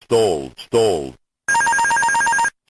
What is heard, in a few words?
Evil Scary Enraged; Evil Scary Becoming Enraged sound effect; Die of Death